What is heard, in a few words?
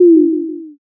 m message